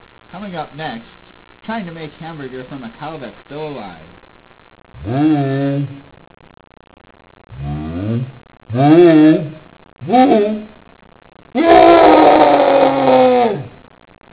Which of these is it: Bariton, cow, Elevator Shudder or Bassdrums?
cow